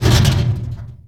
wall barrel; Golden Crayon Sounds

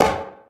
pipe step; Golden Crayon Sounds

